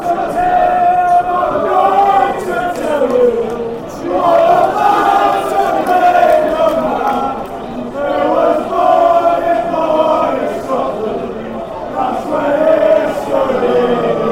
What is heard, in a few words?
ballad fanchants; billy bremner